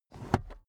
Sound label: car headrest full adjustment quick